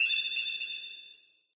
capture rod use; maidroid; maidroid tool

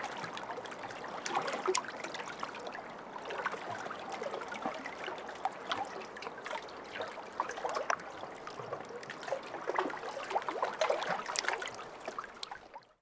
lake waves variety; Ambience

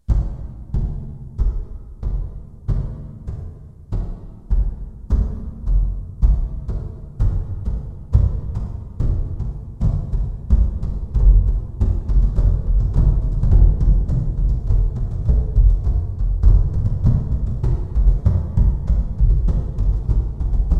drums; dfcaverns; df ambience

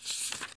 enchanting scroll

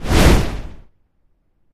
mobs fireball; mobs redo

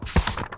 scroll

